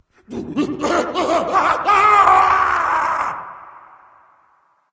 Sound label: nv fear; smite; awilix